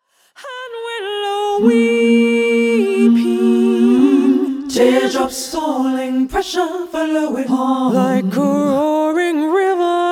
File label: short A Capella; Roaring River